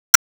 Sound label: Enemy Jet Skill Shield Reflect; Disney Star Smash; Android, iOS, Mobile